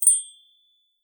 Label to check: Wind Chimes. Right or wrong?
Right